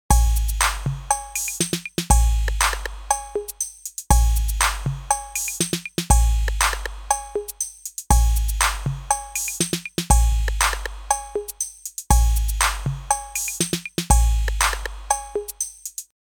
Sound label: 808 clone